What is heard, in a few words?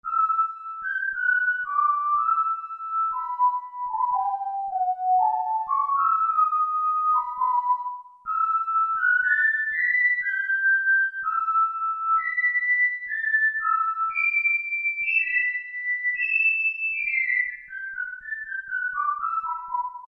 Maze Lead; Factory Preset examples